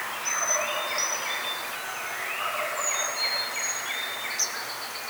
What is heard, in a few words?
wild turkey